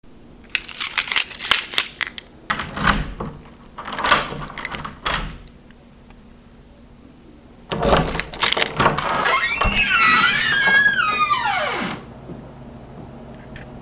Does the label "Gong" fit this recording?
No